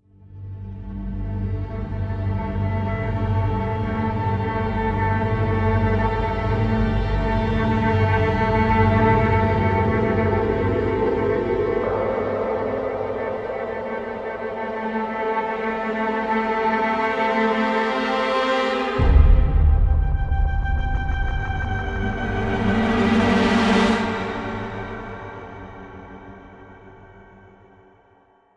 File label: Suspense Oneshot